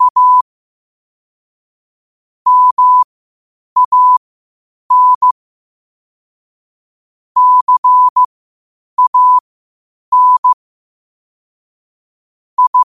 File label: 5 wpm, morse